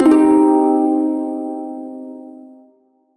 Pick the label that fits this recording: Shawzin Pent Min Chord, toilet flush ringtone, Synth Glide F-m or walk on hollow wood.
Shawzin Pent Min Chord